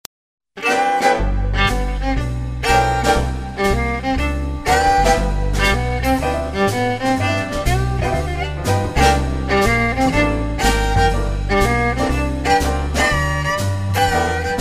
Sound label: Blues